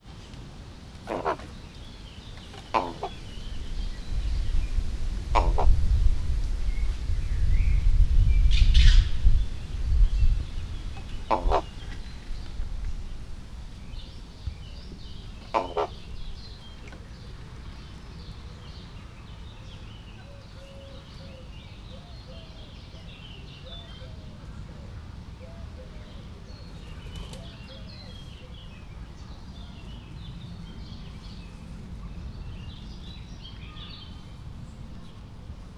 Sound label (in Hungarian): a végén elrepül szél, holló; allathangok; veszpremizoo 2013